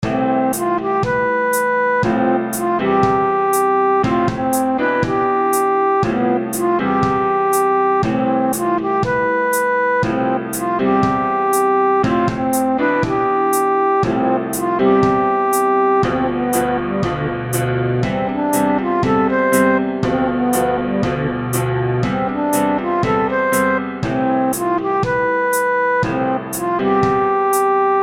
elevator muzak

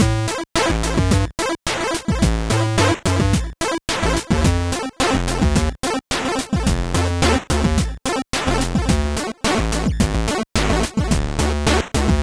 Funky Experimental 8Bit

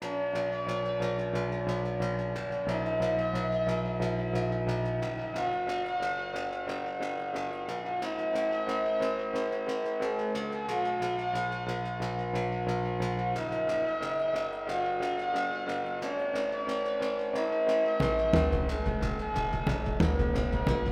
dark forest combat

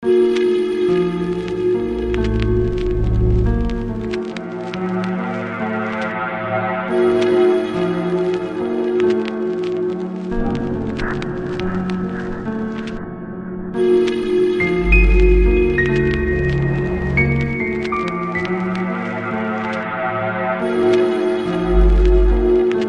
placid

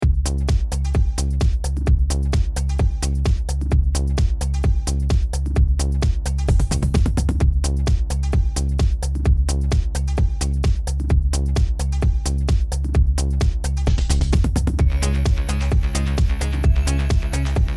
vector racing hard loop

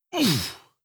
damage grunt male

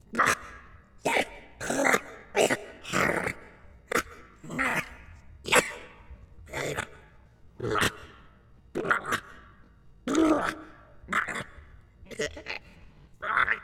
ghoul sounds small

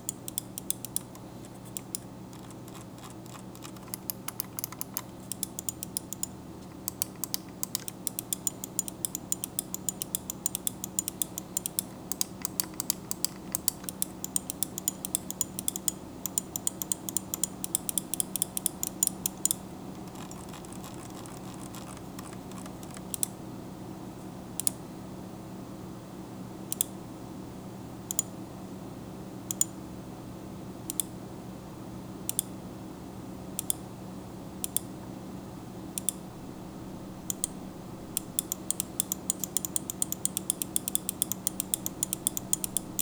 mouse clicking

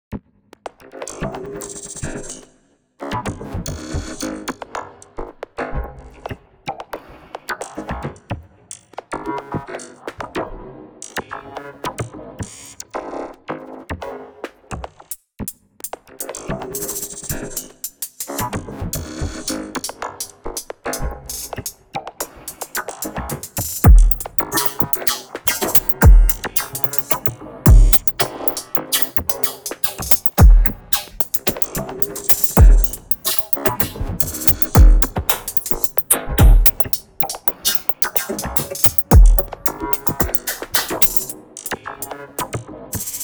sneaking into the robot factory

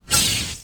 insector alien short

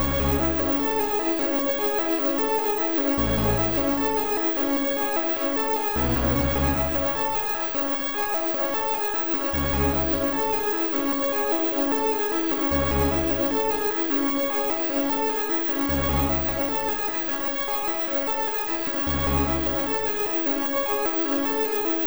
Futureistic Music

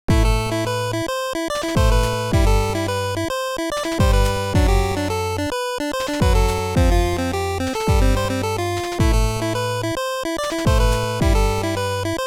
battle intro; CC0 Scraps

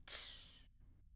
UI Sounds Tsss

